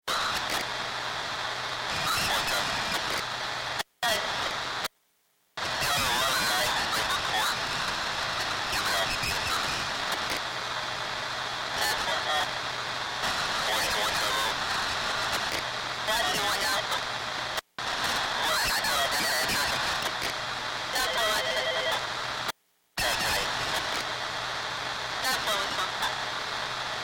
Science Fiction Communications Distorted Radio Signal